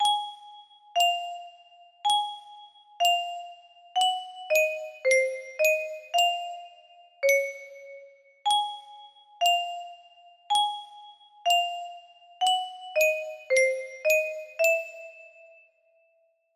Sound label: lullaby, music box melody